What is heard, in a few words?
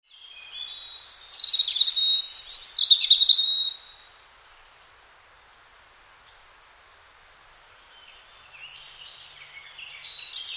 robin